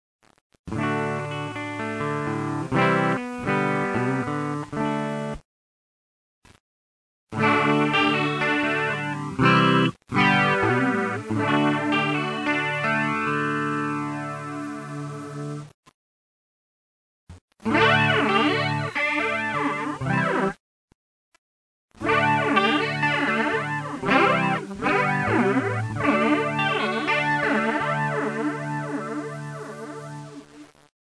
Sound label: Music Flanger Clean